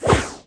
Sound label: enchant FAIL, magic; The Elder Scrolls III - Morrowind; Morrowind GOTY GOG